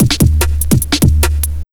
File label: AMB 147 LP1 R, Big Beat Drumloops; AMBIENT 147; AKAI